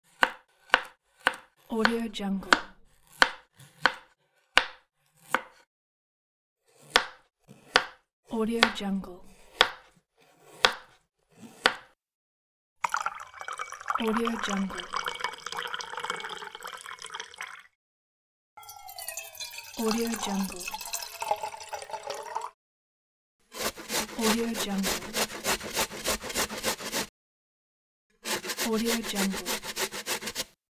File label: cooking pack